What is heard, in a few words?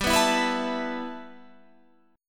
A Suspended 2nd G; Asus2/G; strummed